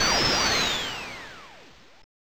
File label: spin charge